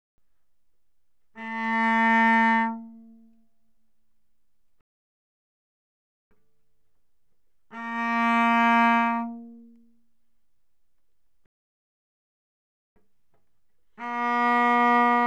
Cello arco mf sul G Bb3Eb4; Strings

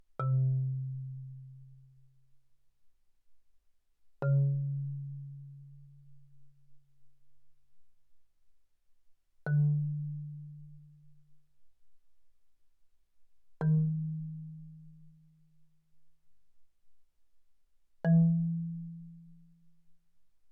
Marimba yarn mf C3 B3; Percussion

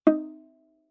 Viola pizz ff sul G Eb4; MIS Pitches - 2014; Strings

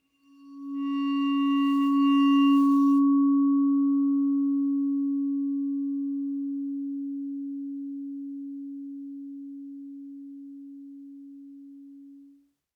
Vibraphone bow Db4 stereo; MIS Pitches - 2014; Percussion

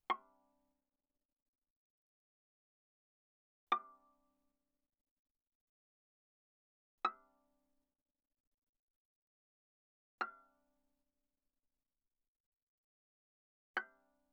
Viola pizz sul A ff C6 Bb6

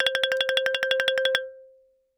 Xylophone hard rubber roll ff C5 stereo; MIS Pitches - 2014; Percussion

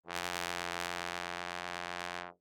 Bass Trombone ff F2; MIS Pitches - 2014; Brass

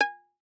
Violin pizz ff sul E A5; MIS Pitches - 2014; Strings

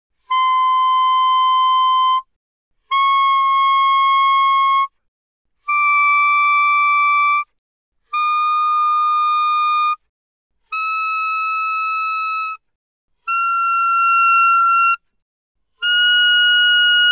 Eb Clar ff C6 A6, Eb clarinet, woodwinds

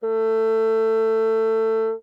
Bassoon ff A3; Woodwinds

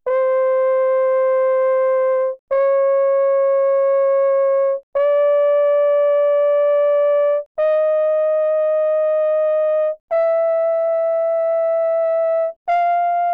Horn, mf, C5 F5, french horn; Brass

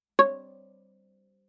Viola pizz ff sul A Db5; MIS Pitches - 2014; Strings

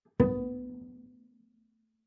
Bass pizz ff sul G Db4, Double Bass; MIS Pitches - 2014; Strings

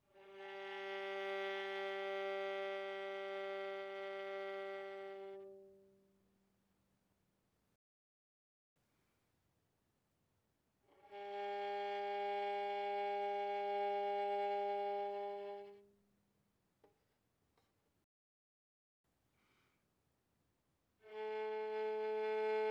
Violin arco pp sul G G3 B3

